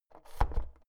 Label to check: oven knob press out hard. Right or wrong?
Right